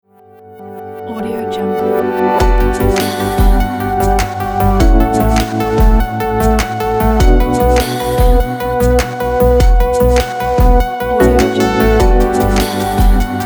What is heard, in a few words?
sexy music pack